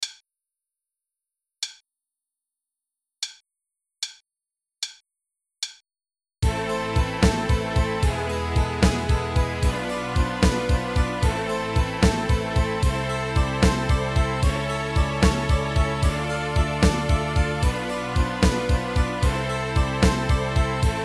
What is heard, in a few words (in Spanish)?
Secuencias lenta